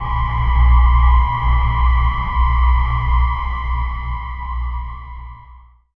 low drone resumes emphasi, metallic

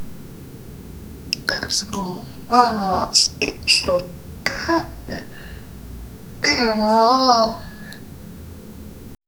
a person being gagged

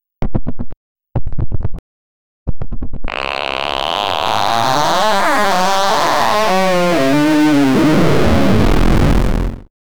make a super loud alarm, obnoxious

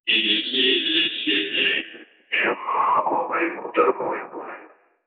gamified resolution of an, success

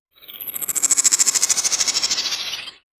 a vintage film style tran, cinema

